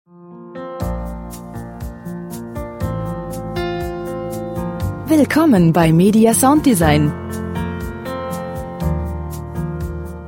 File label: soft peaceful paradise